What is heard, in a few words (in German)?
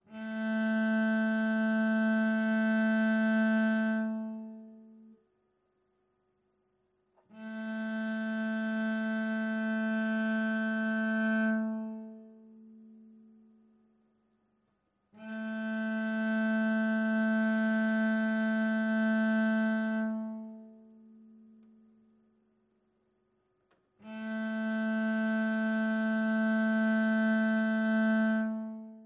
Stimmtoene, Cello; Fiedel Max goes Cello